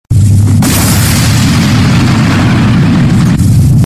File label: major explosion